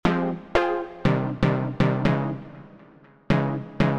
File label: stab